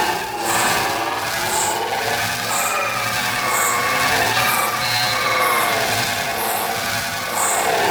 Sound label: Survival Capsule Loop; Warframe; Zariman